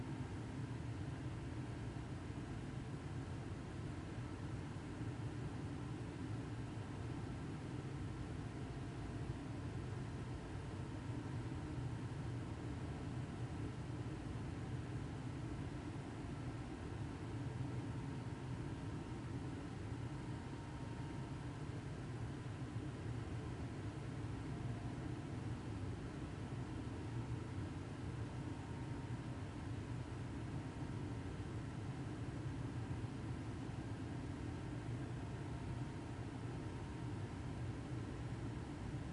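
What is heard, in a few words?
distant long rattling sound of production work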